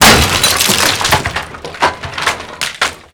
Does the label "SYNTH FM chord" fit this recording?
No